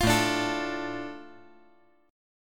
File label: A Suspended 4th Sharp 5th, A